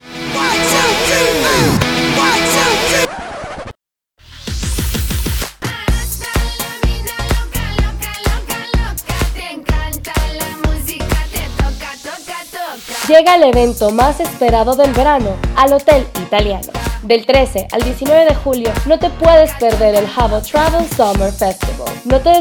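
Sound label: Summer Sound Jingle, spanish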